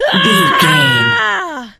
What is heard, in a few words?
voice female battle shout